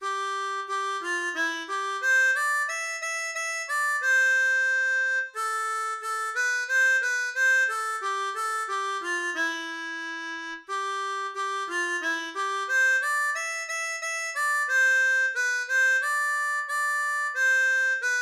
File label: Glory Alleluia, harmonica; Folkloriques